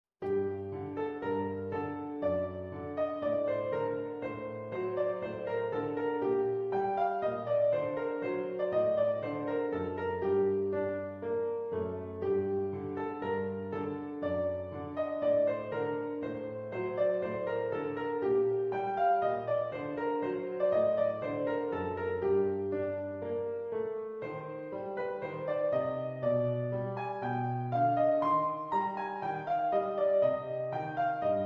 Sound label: sonatina D min